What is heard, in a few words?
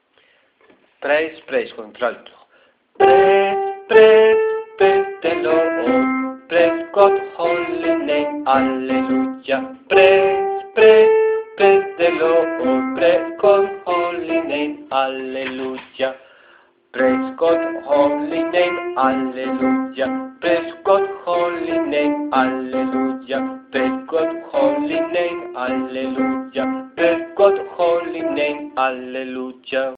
alto